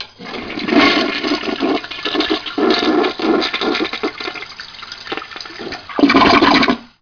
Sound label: toilet flush